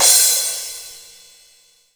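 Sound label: cymbal, real mega drums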